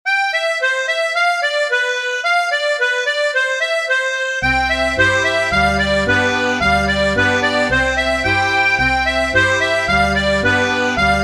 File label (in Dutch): duitse polka; arr rode boek